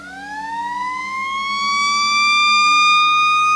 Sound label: Siren; Working Model Program